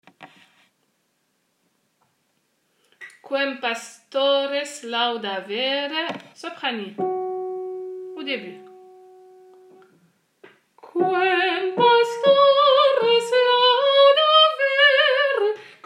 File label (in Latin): Quem Pastore Laudavere Sop